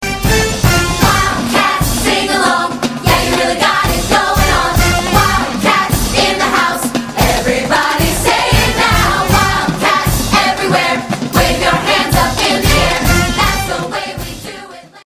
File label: Cheer; Wildcat; highschoolmusicaljr